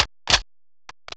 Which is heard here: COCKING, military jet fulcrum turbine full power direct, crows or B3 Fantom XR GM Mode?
COCKING